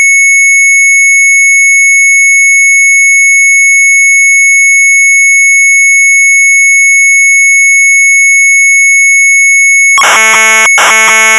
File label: MZ800 Tape; sharp; VIER OP EEN RIJ; retromelsarchive